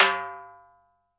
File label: Timbal Hi, Roland BK-5; Percussion